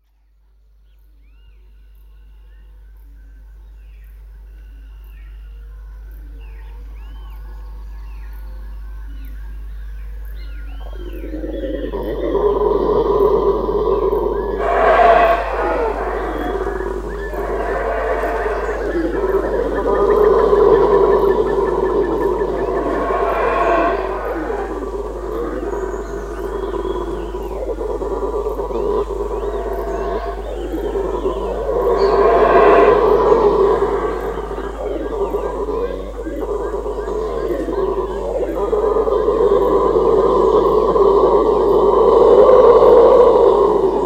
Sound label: HOWLER MONKEY CHORUS; wilderness songs